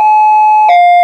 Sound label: hilo, sirens; federal signal pa 500